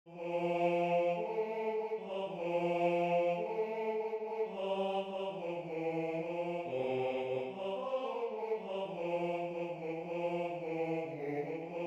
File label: Cantate a Cristo Basso; Base basso; Cantate a Cristo (G. F. Handel)